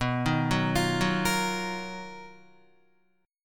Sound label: B-Major Sharp 11th B down Guitar; BM#11 arpeggiated; 4F,4A,5C,5G,5C,6E; chord shape; JGuitar